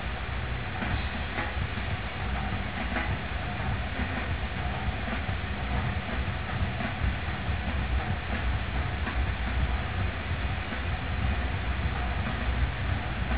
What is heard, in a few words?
phase jazz